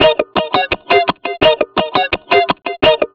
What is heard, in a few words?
Guitars, 170, Ebmin; Total Drum&Bass; 90 s Sample CDs